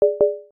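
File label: battery low, Ubuntu System Sounds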